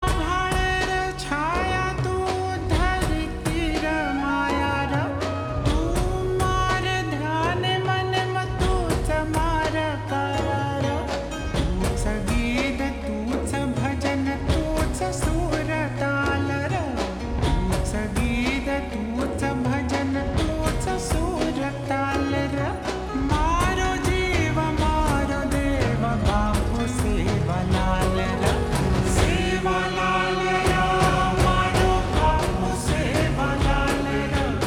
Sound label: Slowed Reverb